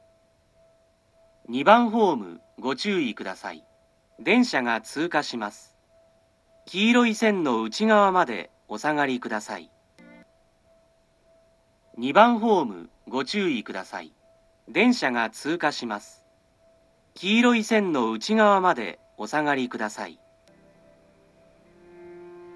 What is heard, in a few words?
pass, station; tokorozawa